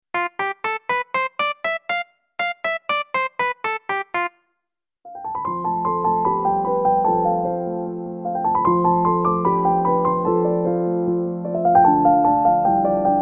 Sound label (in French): lydien